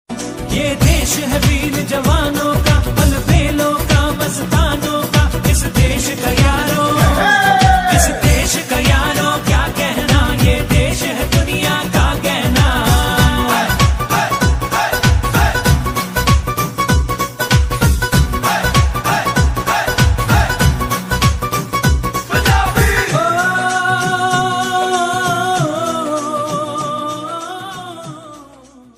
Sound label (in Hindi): desh bhakti geet ringtone; 15 august